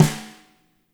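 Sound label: fat snare; Spectrasonics; Burning Grooves CD2; DRY KIT 3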